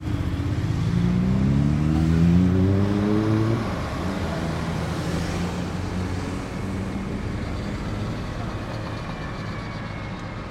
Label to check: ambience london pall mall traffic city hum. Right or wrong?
Right